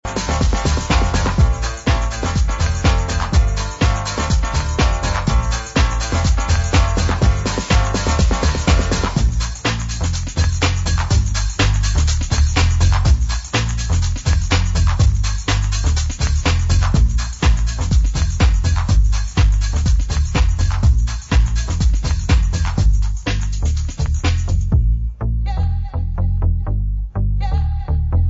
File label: deephouse instrumental